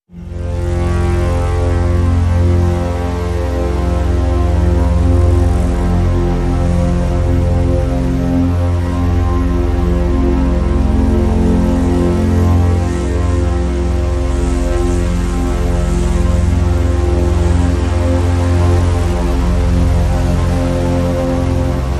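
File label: Drone Bowed Bass F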